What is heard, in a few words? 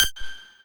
lead